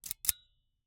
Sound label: Icecream Scoop, metal, mechanical, teeth, gear, squeeze, release; Eiravaein Works; Vaeyan IV